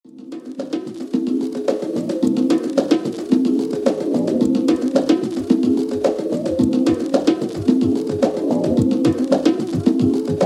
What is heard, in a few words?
Spacedrum